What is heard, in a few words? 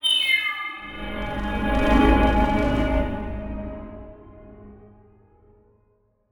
Title Transition Evolver Short; Production Element